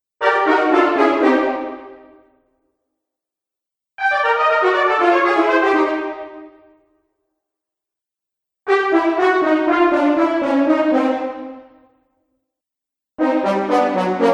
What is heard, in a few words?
Cartoon Failure Brass; Cartoon Music; Brass Collection